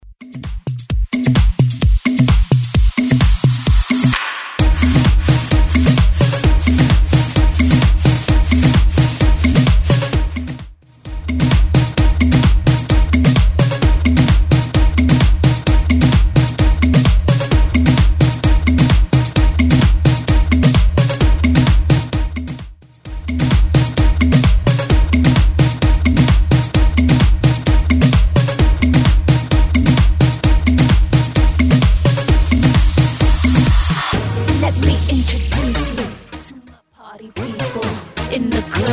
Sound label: Bassanova Bootleg 130bpm